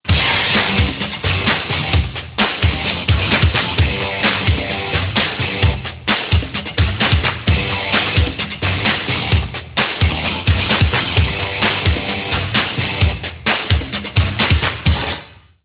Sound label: drums and distort; rock; instrumental